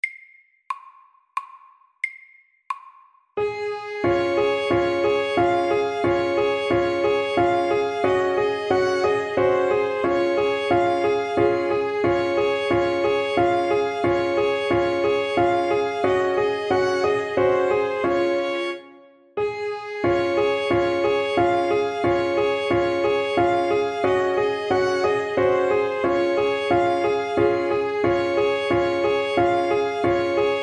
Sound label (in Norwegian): German dance SAKTE